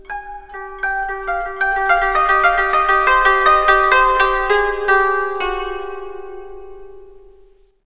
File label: Koto